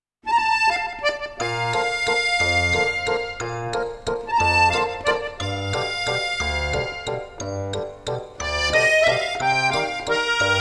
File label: Cheerful March